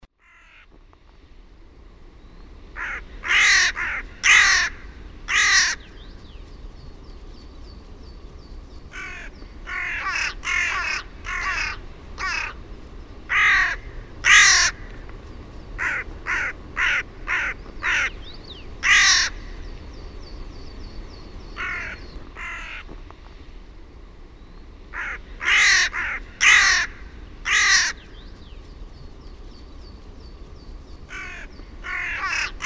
VARIS, Linnut; OMAT AANITYKSET

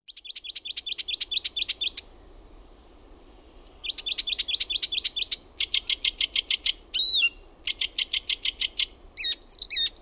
VIITAKERTTUNEN, LINTUAANITYKSET

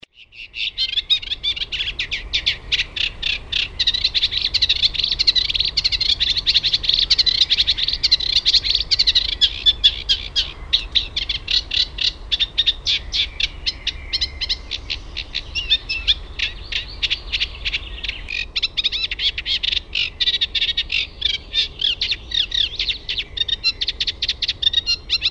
RYTIKERTTUNEN, LINTUAANITYKSET

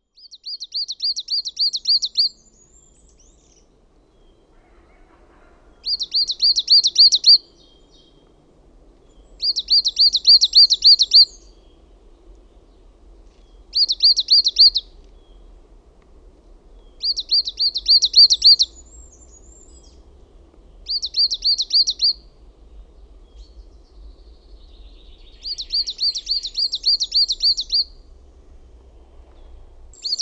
KUUSITIAINEN; LINTUAANITYKSET

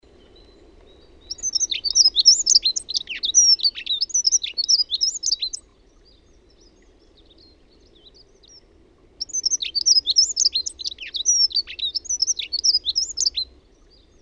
RAUTIAINEN; LINTUAANITYKSET

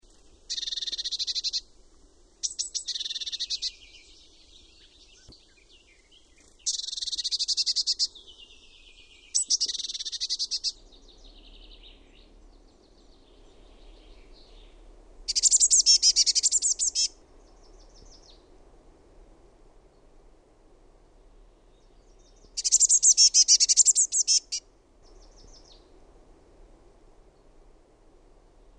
SINITIAINEN, Linnut; OMAT AANITYKSET

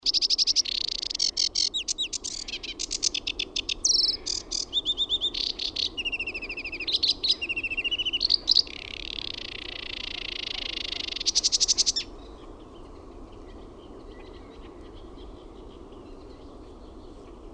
RUOKOKERTTUNEN; OMAT LINTUAANITYKSET

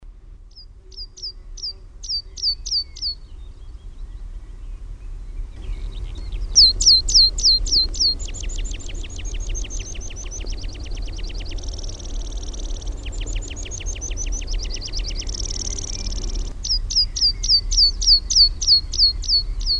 NIITTYKIRVINEN; LINTUAANITYKSET

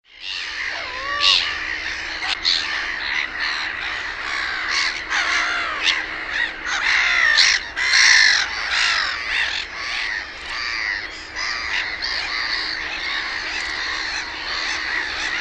NAURULOKKI; OMAT LINTUAANITYKSET

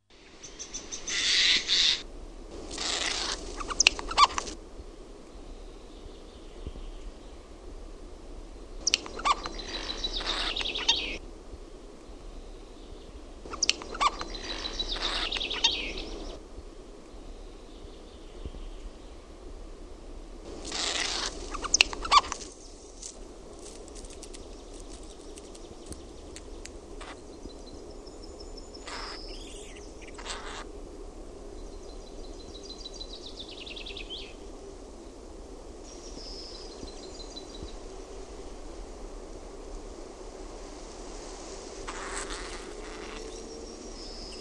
NARHI; LINTUAANITYKSET

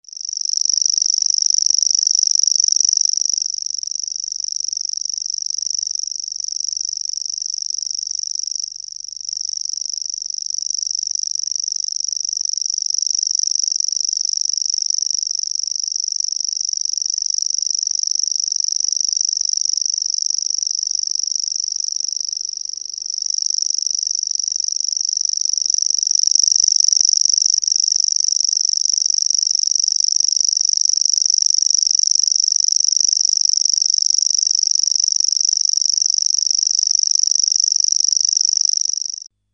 PENSASSIRKKALINTU; LINTUAANITYKSET

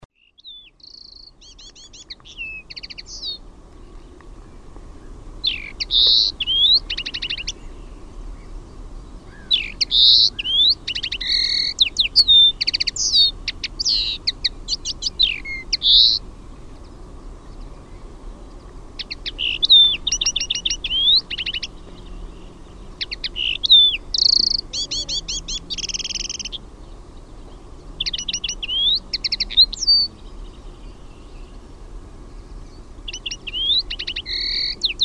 HEMPPO; LINTUAANITYKSET

